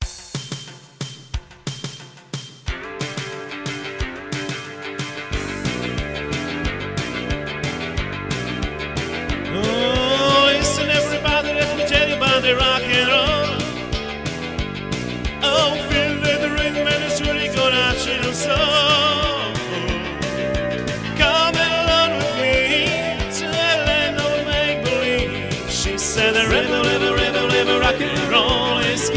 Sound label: rock